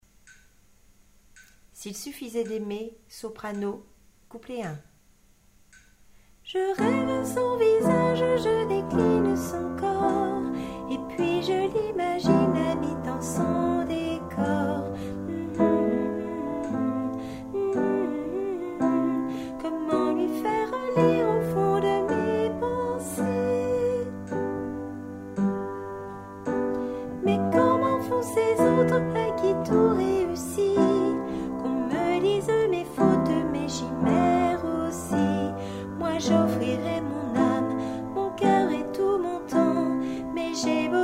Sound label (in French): soprano